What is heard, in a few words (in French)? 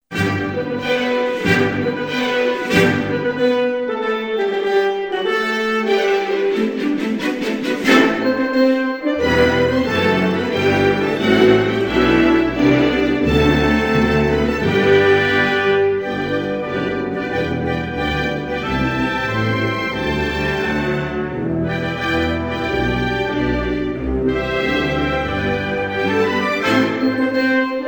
Quatar, Hymnes nationaux